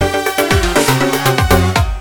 failure; tf2ware ultimate; music game; switch moveit cricket

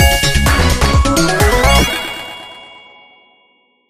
victory; tf2ware ultimate; music game

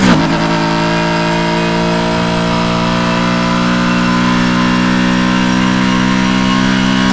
fourth, vehicles; nfs heat ganimator pack

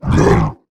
attack, male zombie; nmrih; zerosiki; slender

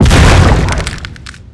pound victim, tank hit

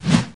swing

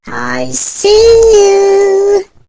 granny alert; zerosiki; slender

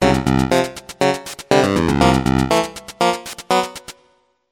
urgent, music minigame; tf2ware ultimate

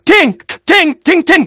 scout triple jump

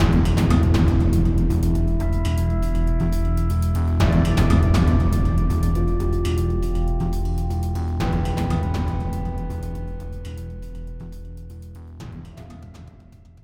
lost round; music; sudden attack; tfgo

